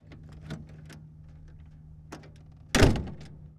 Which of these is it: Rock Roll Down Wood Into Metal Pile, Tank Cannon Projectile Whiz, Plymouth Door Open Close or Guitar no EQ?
Plymouth Door Open Close